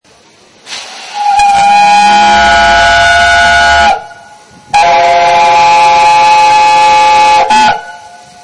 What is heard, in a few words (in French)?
siffler le train